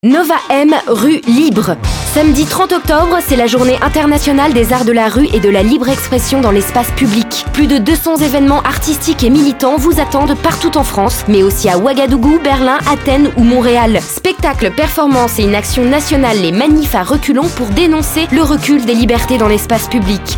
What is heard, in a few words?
jingle mono; nova; Libre expression sur les ondes hertziennes et web